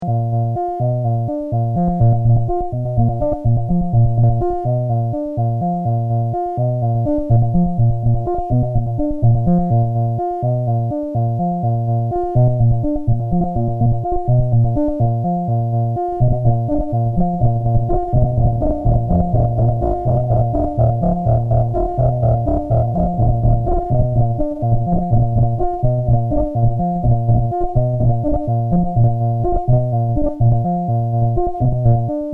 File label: modular; synth DIY